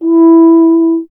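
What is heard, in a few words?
brass French horn; Miroslav Vitous; Solo Instruments VOL-2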